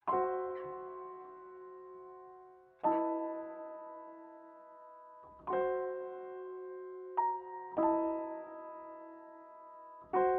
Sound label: tune